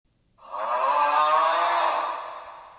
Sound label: aaaaaauuuuuuuughhhhhh echo